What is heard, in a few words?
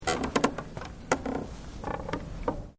Wood Creaking; Material components for constructing more complex sounds; Materials